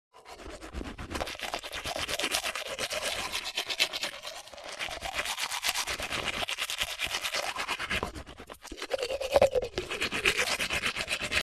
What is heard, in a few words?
Human brushing teeth close perspective